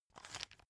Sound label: pepper mill light; soundpool